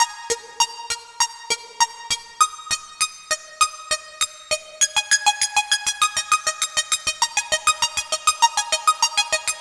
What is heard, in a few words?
Melody, IDM